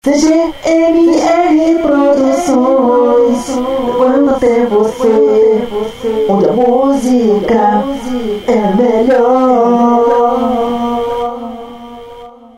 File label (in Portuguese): vinheta cantada